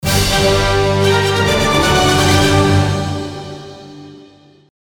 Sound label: Victory Stinger; ratchet clank into the nexus